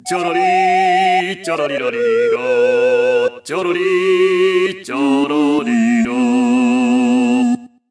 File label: Jingle Mission Failed; Dandy Dungeon Legend of Brave Yamada